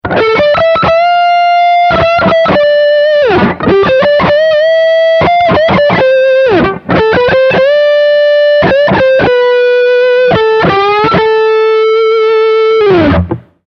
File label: Fat Lead